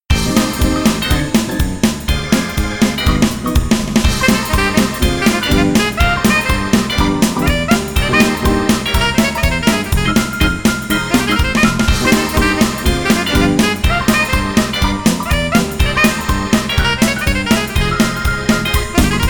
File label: Hard Bop